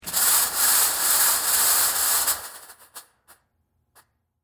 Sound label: Smoke Machine, Theatrical, Stage; Film Set Sound Effects; BlastWave